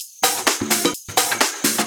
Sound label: Percussive Loops 128BPM; Vengeance Samples